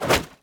Grain catch

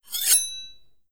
Unsheathe; Legend of Keepers

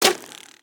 Chinese Tower; Rabbids Multiverse

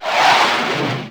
Jet flyby; Awesome Planes

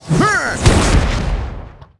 ArcWright attack

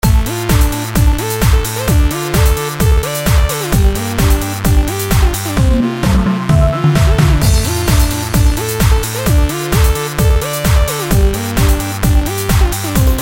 Loop trance

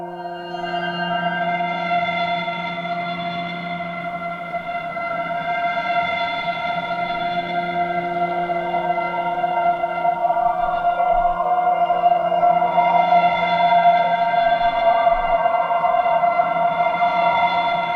Teleporter Cone; Teleporter Cone2

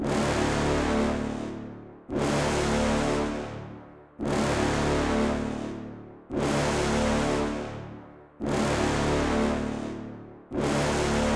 Loop

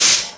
Brakes

